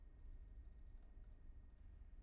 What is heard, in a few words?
mouse; IEEE AASP